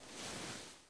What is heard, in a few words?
body slide gritty, foley